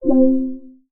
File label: object select; ChromeVox Classic Sounds